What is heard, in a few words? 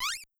Monster Squeak